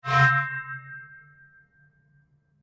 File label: Created surge; Various sweeps, pulses and drones; Rise And Dive Sound Effects; Sound Design